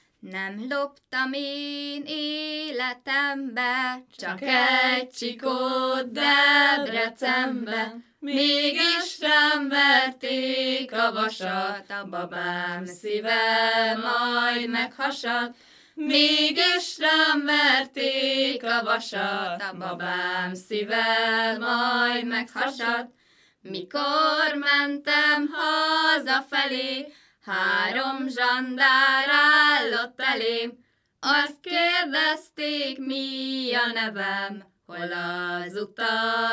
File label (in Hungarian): nepdal; nem loptam én életembe